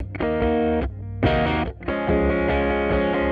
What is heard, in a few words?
D-6 Near, Electric Guitar